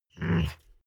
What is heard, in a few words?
dog whine low defiant; natur-tiere; hunde